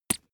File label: bath duck hit short